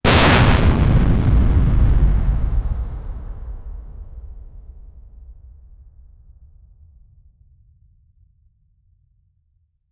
outdoor explosion gritty

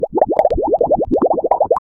bubbles with a consistent

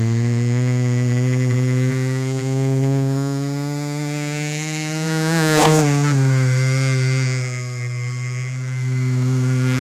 drifting sound, motorbike

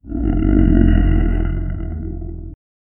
an abstract deep and reso, ancient

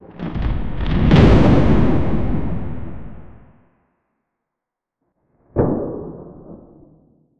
anime thunderbolt

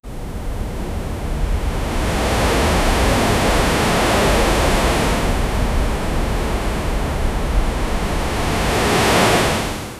crashing waves during a, hurricane

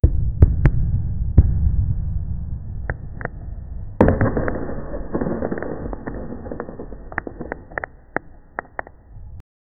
a dungeon

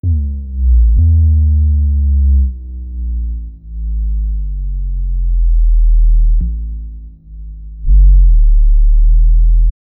a powerful bass drop in an electronic music track

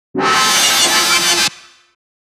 cyberpunk dection, futuristic

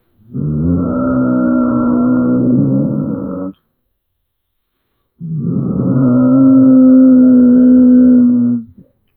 whale fish voice, marine

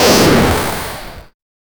cyberpunk

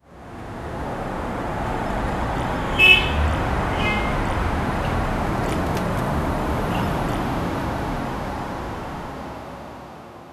soft wind distant adhan

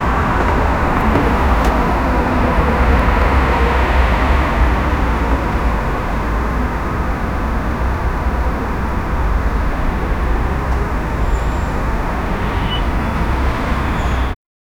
city noise with car sound

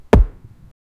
kicking the ball

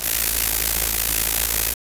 high voltage overload, arcing

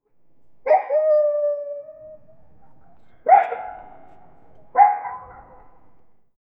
echoing squawk with; animal noises

